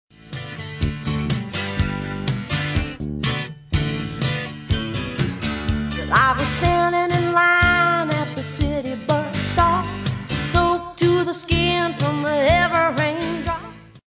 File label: Music In Style